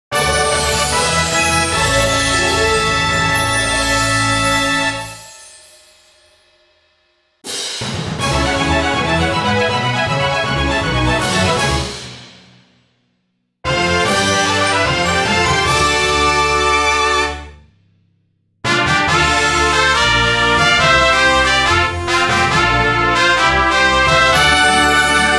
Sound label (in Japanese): その他ジングル